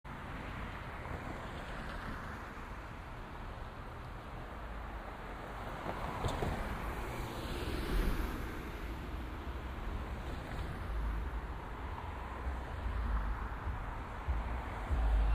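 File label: street noise binaural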